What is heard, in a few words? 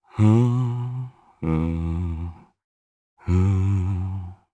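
Vox Hum, Japanese; kingsraid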